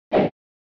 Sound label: SNES Fighting Swing